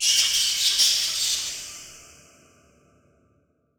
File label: sewer bat; garrysmod